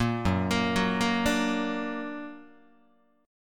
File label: F sharp Augmented F sharp Guitar; arpeggiated; 4A,4E,5A,5D,5G#,6C#; 1,2,1,4,2,1; JGuitar